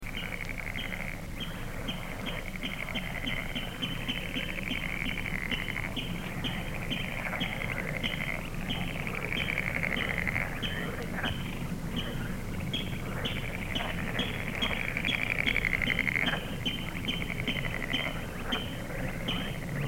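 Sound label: pastushok golos, water rail voice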